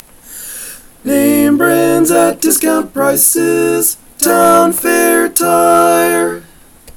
Lead, barbershop

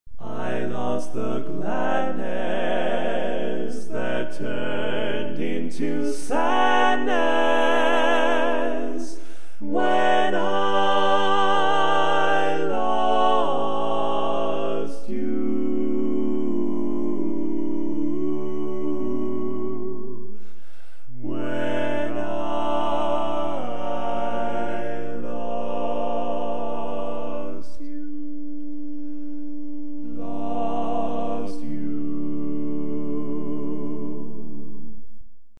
Lead, barbershop

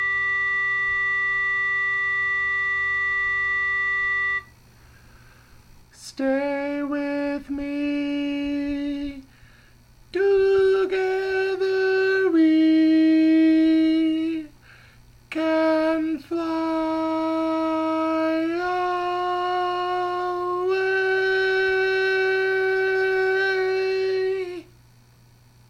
Tenor, barbershop

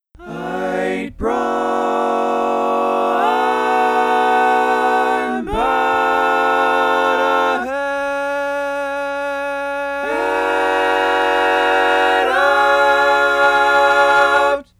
Tenor, barbershop

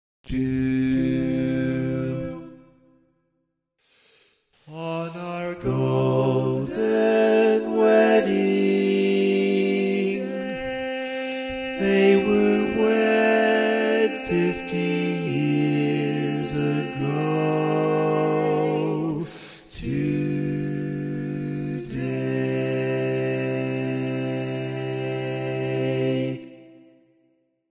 Bass, Barbershop

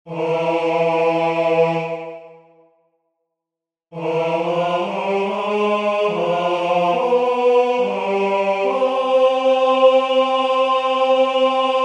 Bass, barbershop

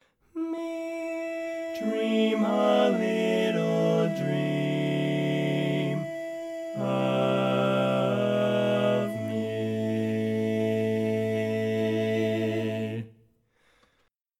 Lead, Barbershop

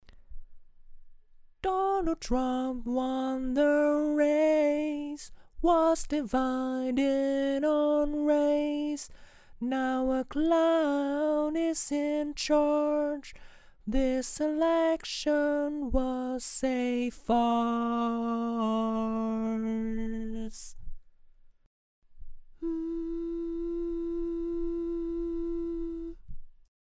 barbershop tags

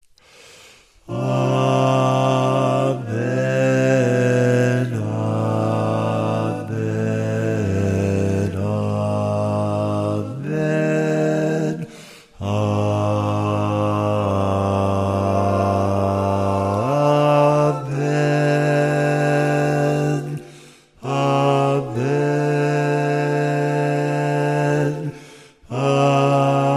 Bass, barbershop

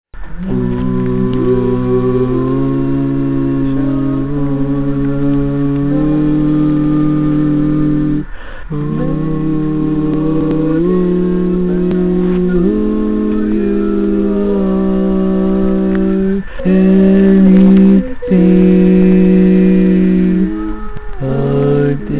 Bass, barbershop

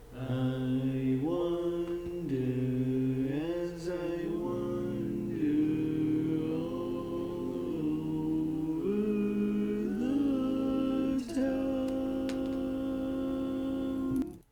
Tenor, Barbershop Tags

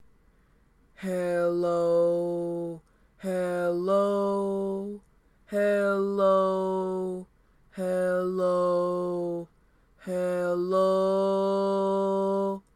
Bass, barbershop

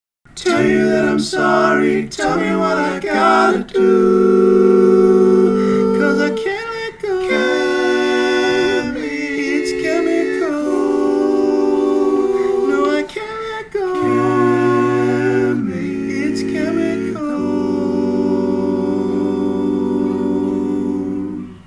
All Parts, barbershop tags

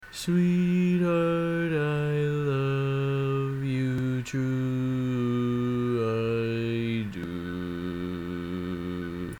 Bass, barbershop

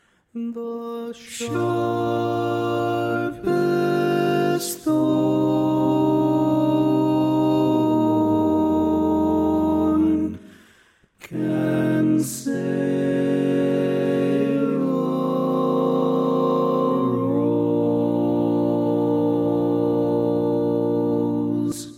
Tenor, barbershop tags

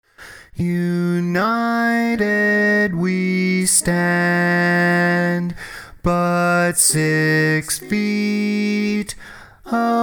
Bass, barbershop

